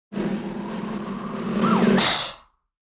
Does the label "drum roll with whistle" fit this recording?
Yes